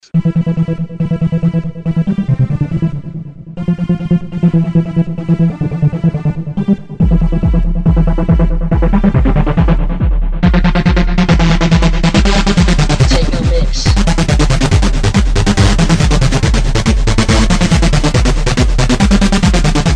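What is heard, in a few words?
jingle bells techno remix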